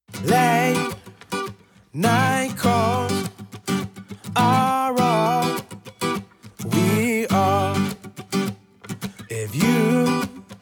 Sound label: (Acoustic)